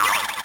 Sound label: spring; wario